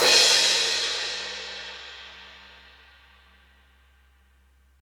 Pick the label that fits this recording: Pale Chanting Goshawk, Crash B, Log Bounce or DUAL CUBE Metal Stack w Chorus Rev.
Crash B